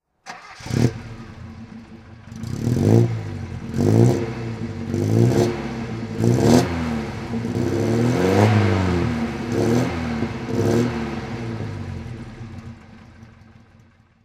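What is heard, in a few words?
alfa giulia 1300 throttle, engine sound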